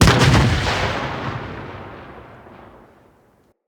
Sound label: Destroyer Meltdown Small Explosion; Vehicles; RailJack; Crew Ships; Grineer Crew Ship